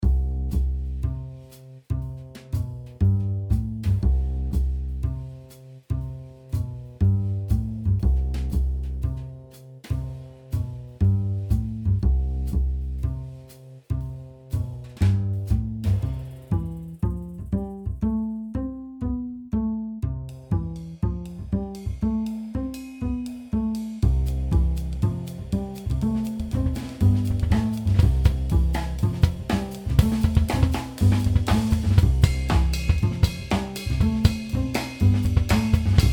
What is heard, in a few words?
Jazz